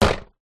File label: impacts plastic seat hit; zapsplat